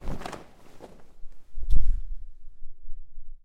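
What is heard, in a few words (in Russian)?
zvuki padenii cheloveka, human falling sounds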